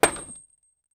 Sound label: ui inventory sound heavy